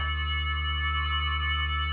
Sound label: magnet loop